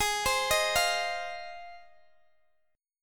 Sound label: Ab 6th down Guitar DADGAD